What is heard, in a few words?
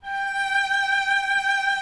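cello vibrato, G5; Xsample VOL-7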